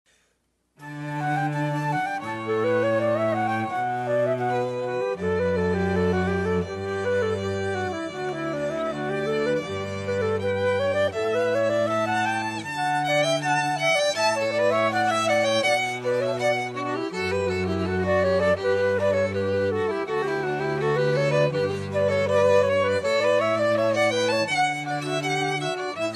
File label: pachbel canon d